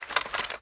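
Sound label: Inspect Movement; Valorant; guardian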